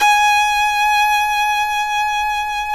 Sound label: FIDDLE NOTES, G#5, L